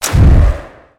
Rock Blade

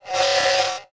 Blink; Mob

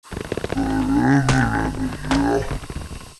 STONEBLOCK PEACE IDLE

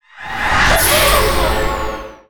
war powerup

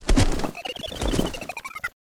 THORNASH PEACE IDLE

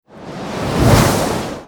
Aqua Blade

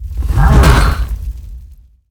War Attack; Greatblock

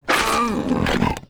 damage

